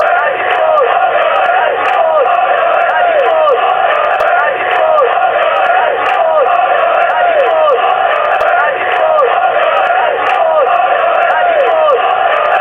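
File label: barmy army, football songs and soccer chants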